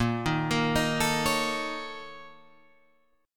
A sharp Minor Major 7th Double Flat 5th A sharp Guitar Open G